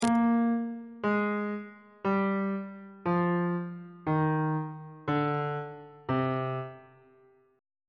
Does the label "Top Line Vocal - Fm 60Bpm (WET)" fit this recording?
No